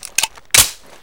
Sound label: uspc clip in, weapons